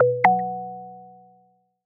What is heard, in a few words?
chat login alert